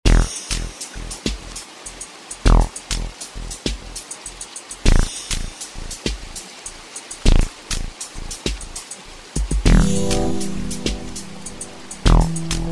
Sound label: beat